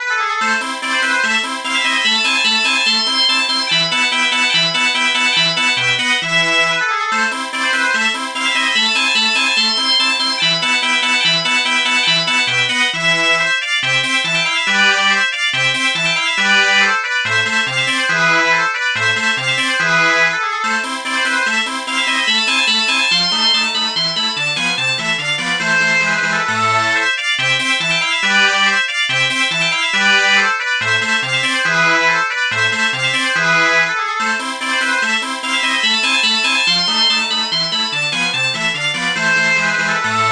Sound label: bagpipe